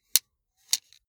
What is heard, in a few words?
sunglasses metal side piece fold